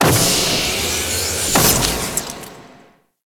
Ship Metal Mech; Warframe; Corpus Ship Remaster

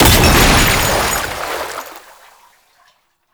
Sabotage Coolant Pipe Burst; Warframe; Missions

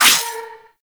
Shadow Melee Swipe; Warframe; Duviri

